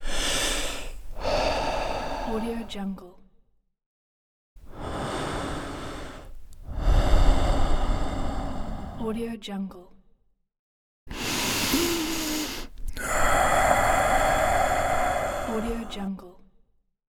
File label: breath